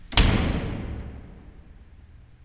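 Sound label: Metal door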